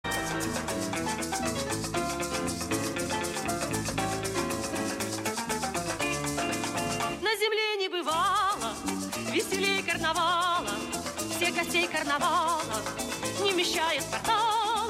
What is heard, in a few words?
samba